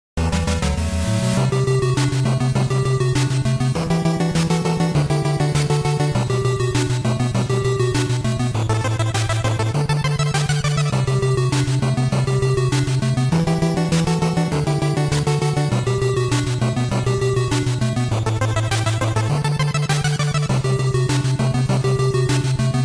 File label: NES Soundtrack 8Bit Stereo; Battletoads Double Dragon The Ultimate Team